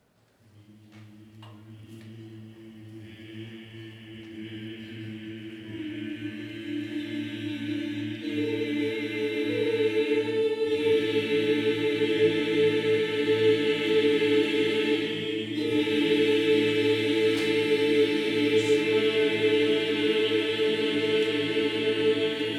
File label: choir; ERT